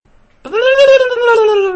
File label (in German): twitter nachricht